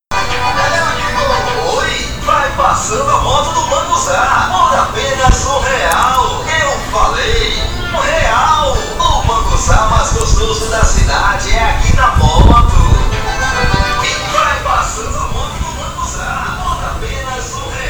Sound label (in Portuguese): moto; moto do munguzá